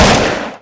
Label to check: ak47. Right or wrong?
Right